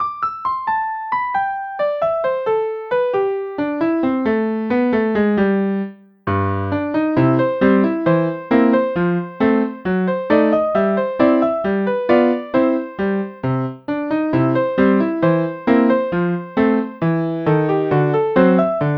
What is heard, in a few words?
Entertainer piano solo